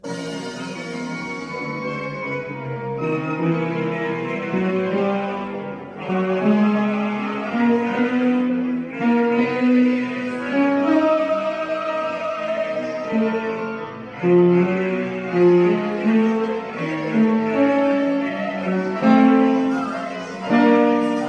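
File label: basses; Cardillac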